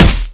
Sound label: blunt hit bod